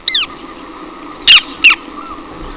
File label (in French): tournepierre